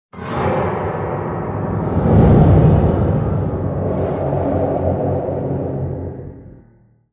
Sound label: Dock fire